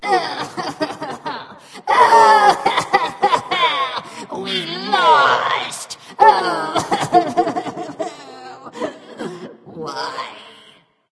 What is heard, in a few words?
boohoo; smite; voicepack; hel